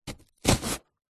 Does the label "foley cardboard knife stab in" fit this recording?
Yes